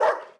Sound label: Wolf barks; SNKRX